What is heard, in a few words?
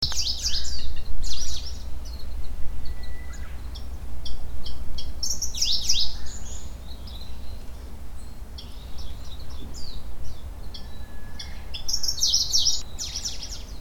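Styan's grasshopper warbler; uchiyamasennnyuu; miyakejima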